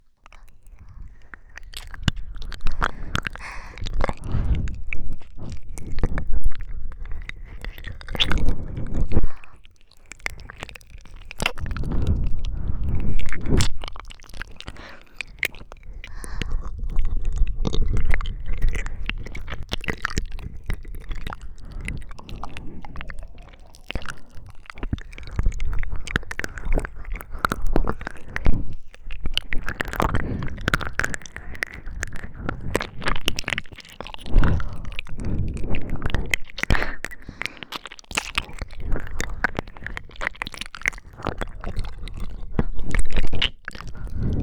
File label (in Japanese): 耳舐め のみ 両耳